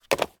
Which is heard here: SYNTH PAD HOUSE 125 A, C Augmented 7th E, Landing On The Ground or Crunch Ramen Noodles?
Landing On The Ground